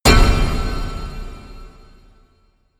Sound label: anvil hit shimmer